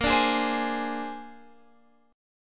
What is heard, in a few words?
A sharp 9th A sharp Guitar Open F; strummed